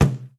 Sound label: Ball Bounce; angry birds rio